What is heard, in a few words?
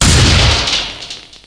emp fire